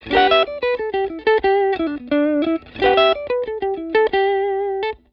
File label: STRAT POS 2 LICK, S pickup set